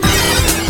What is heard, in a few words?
Card activation